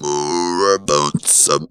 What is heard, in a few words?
Funky Vocals; USB Soundscan vol.18; AKAI